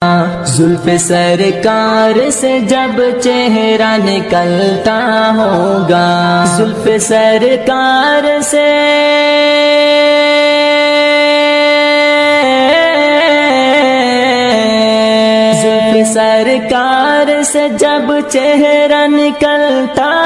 New Islamic Naat Shareef Ringtone

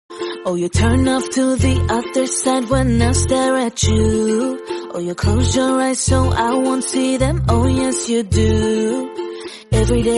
English Version Ringtone

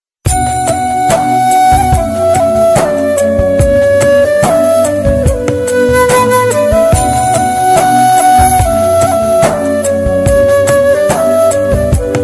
Bollywood Romantic Instrumental Ringtone; ringtones

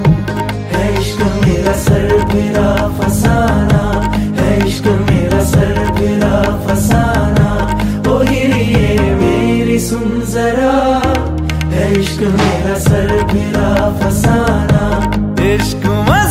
New Romantic Hindi Song Ringtone

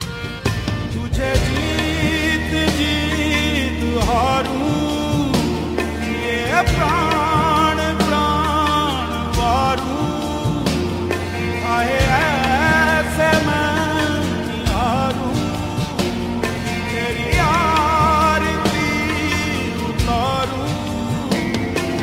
Sad Song Ringtone

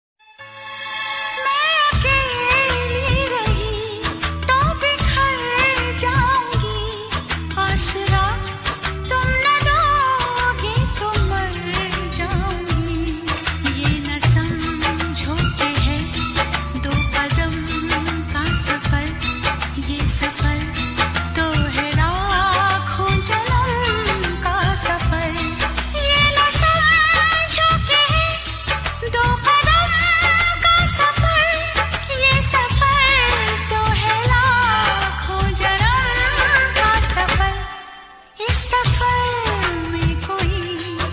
Female Version Most Emotional Love Song Ringtone

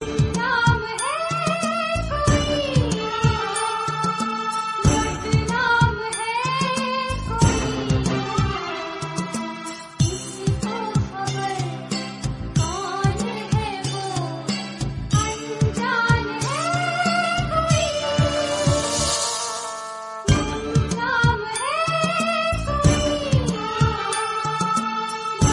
Old Hindi Song Ringtone

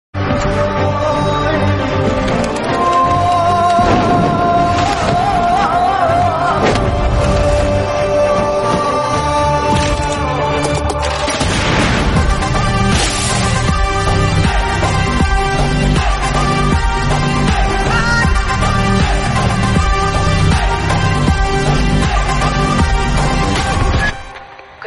Bgm Ringtone; ringtones; Jawan

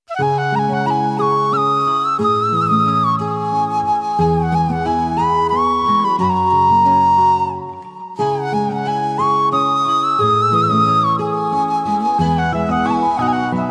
Movie Song Instrumental Ringtone

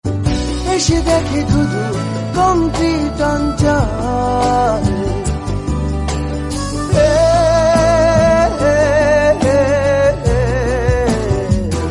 Bengali Song Ringtone

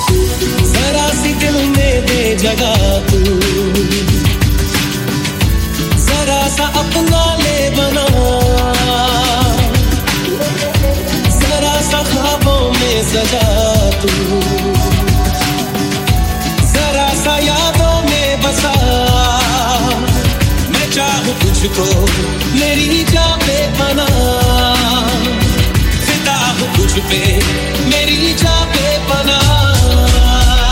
Chillout Remix Ringtone

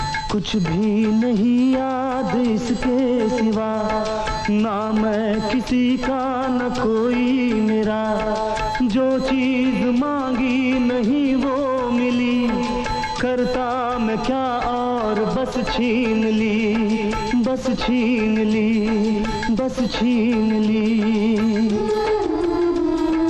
Sad Song Ringtone

